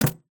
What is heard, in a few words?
catch click